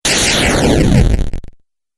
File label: Fireball Video Game